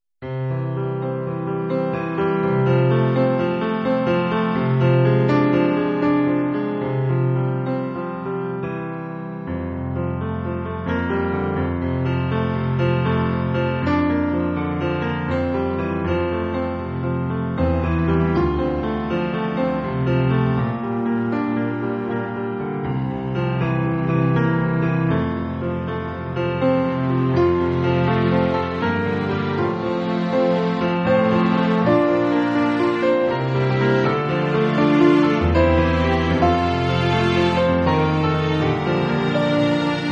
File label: Klavier Celli Fl; Masuren